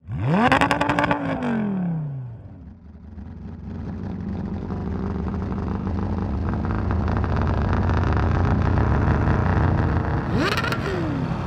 scifi; krotos igniter

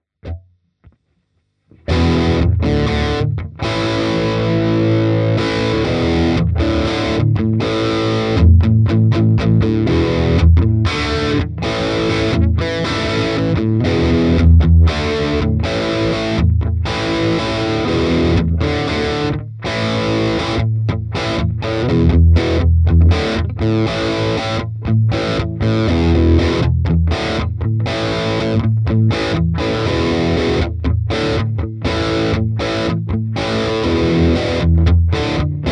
Crate FW15R overdrive gain 12

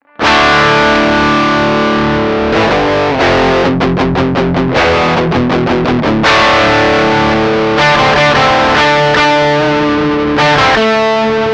Kammer Tiny K test overdrive direct out

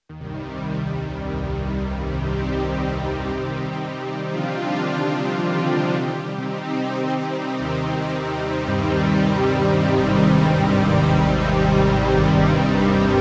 pad; casio xw-p1

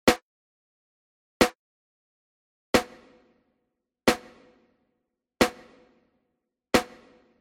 small hall smooth; Flux IRCAM Verb

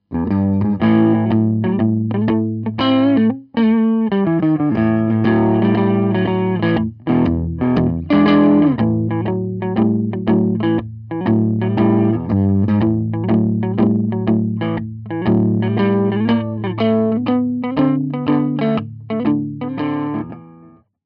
bluesy; Gitarre

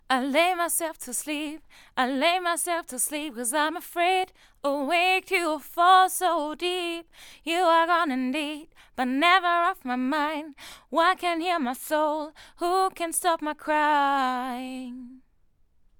vocals; smproaudio mc03

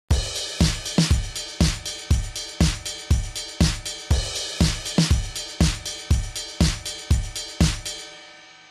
drums; butch vig

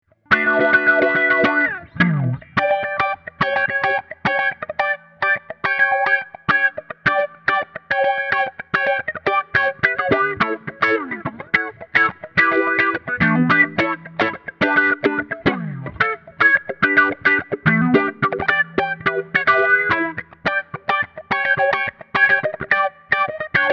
harley benton dnafx git

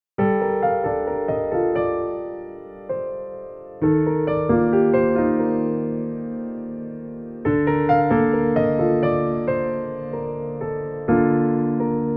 grand; motu machfive 3

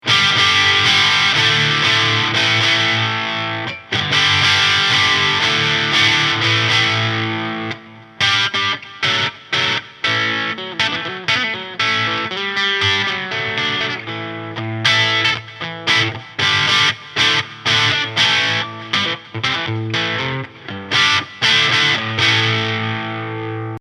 kong tubefive head crunch

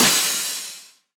Crash

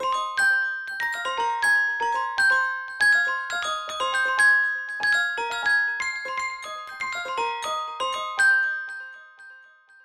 melodic bells arp; heavyocity aeon

